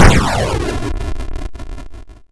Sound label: launch; Republika Exhibtion Games; luftrausers